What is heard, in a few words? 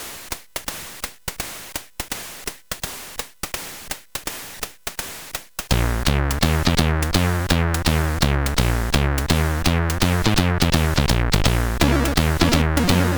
Jazz Test, CSG8580R5